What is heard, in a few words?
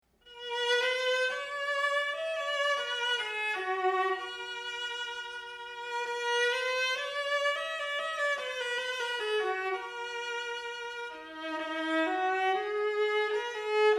viola